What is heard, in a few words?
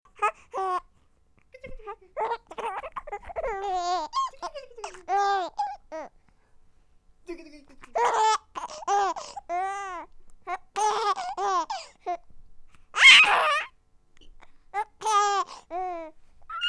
laugh